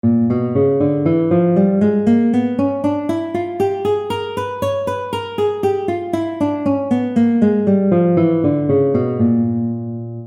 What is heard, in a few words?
Diminished Dominant Scale